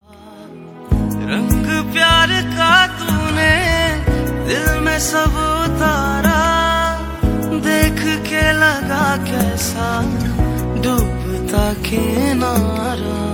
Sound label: Ost pakistani ringtone